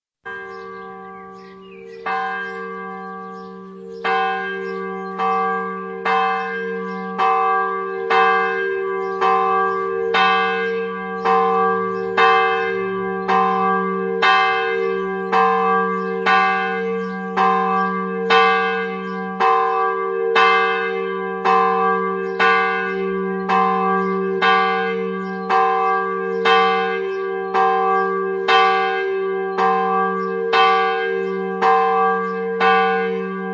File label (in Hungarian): HARANGSZÓ ESTÉRE; szignalok; SZEKELYUDVARHELY